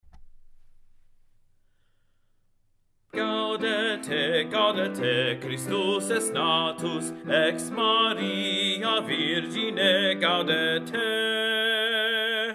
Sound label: Bass; Gaudete! Christus Est Natus, Pies Cantiones